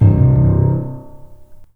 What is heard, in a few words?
LOHARM, The Piano; InVision Interactive